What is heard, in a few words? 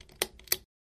Light Switch Pull Ch; switches